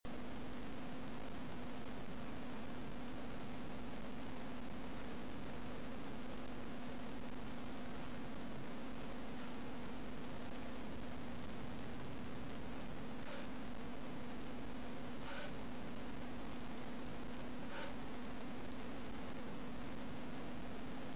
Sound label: big wet sneeze, female